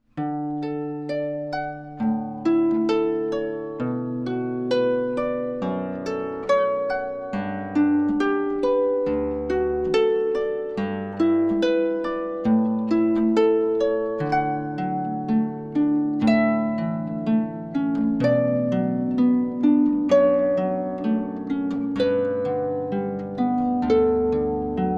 Canon in D, harp

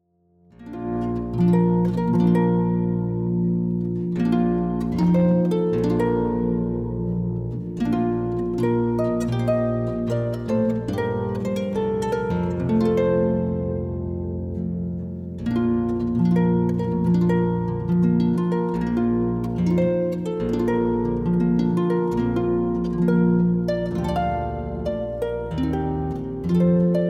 Bridal Chorus, harp

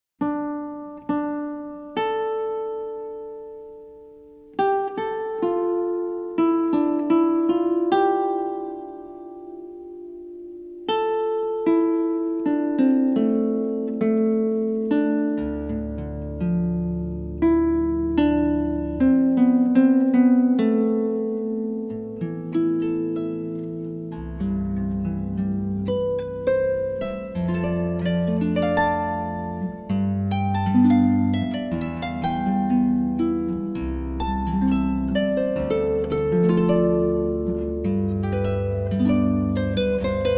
harp; Polovetsian Dance

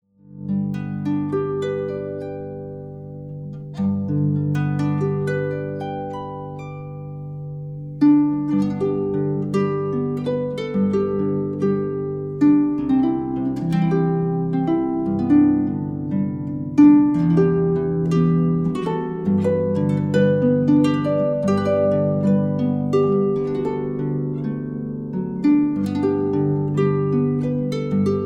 harp; flow gently sweet afton

